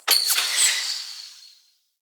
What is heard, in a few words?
Bow Arrow Projectile Start; Sinecure